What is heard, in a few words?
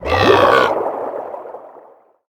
Lagoon Monster Burp; Zombie Catchers